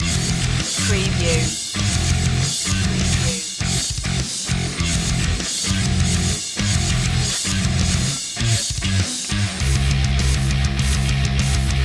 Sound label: bass heavy jams